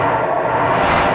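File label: force knockback loop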